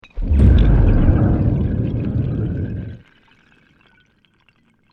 EXPLOSION UNDERWATER MEDIUM, Created explosion events, underwater explosive event; Bombs